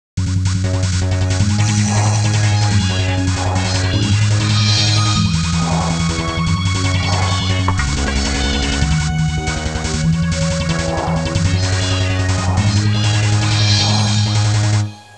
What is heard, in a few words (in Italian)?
musica elettronica